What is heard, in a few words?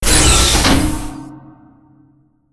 door open; jailbreak; Portal 2; Source Engine; video games